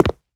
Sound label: rubber, footsteps; player